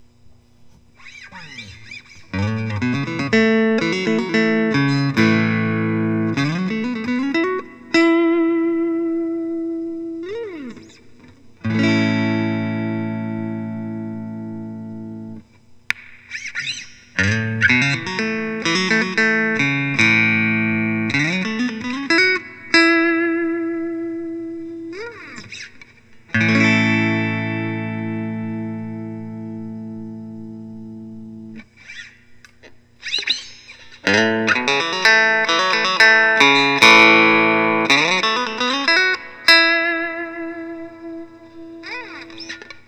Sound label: fender telecaster